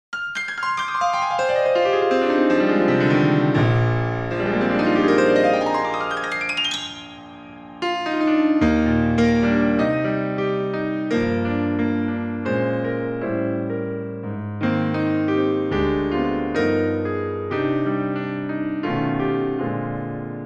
Purgatory Large

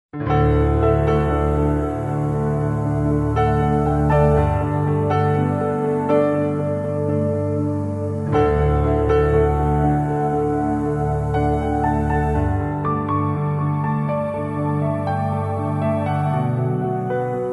New Age Stein ff

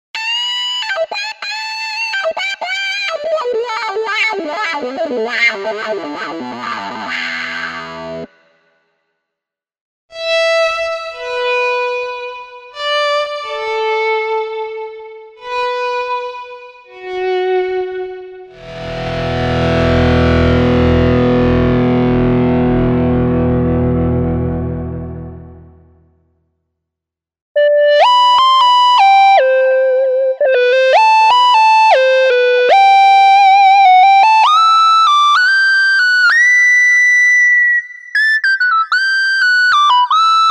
Guitars and Synths

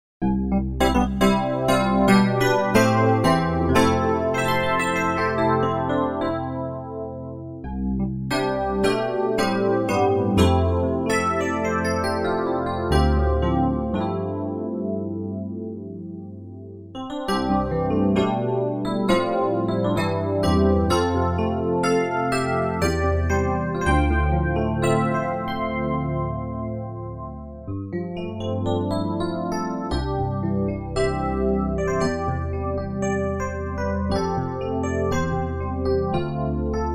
FM EP programs medley

